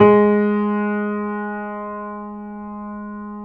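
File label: G#3, grand piano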